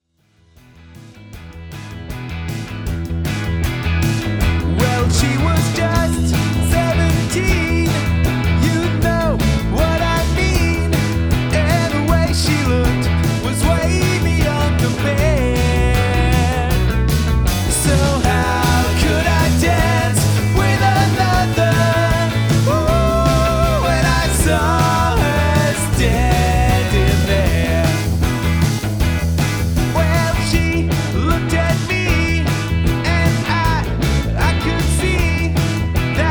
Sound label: Cigar Box